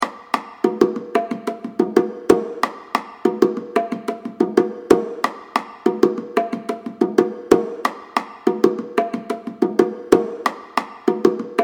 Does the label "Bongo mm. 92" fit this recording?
Yes